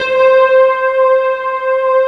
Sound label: JAZZY C4